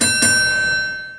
party alarm

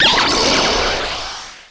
kings grace

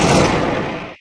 chimera lava attack

